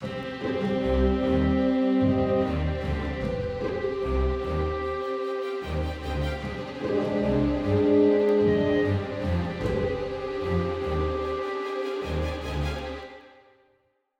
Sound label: exploration, Orchestral Background Music, epic battle intro; Epic Adventure